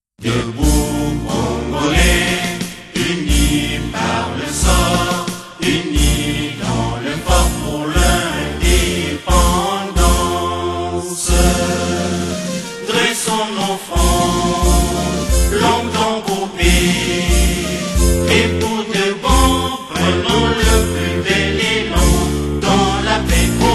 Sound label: chantee